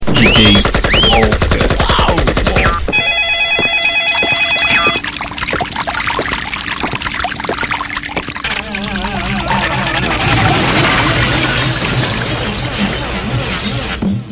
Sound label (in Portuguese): pisadas dj